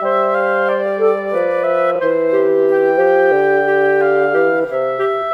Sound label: Cinematic Winds; Sonic Foundry (Sony Creative Software); Orchestral Series VOL-3; Cinematic 25